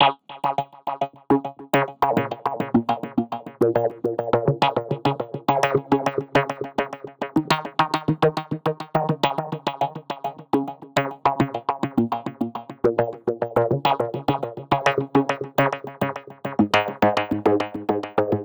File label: ambient lap guitar, 104bpm